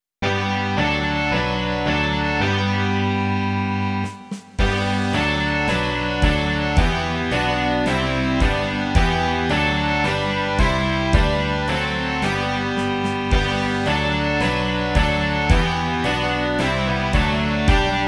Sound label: rock